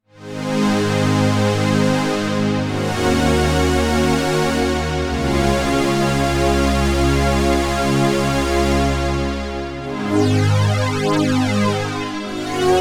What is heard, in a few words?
Synth Strings; KORG Dipole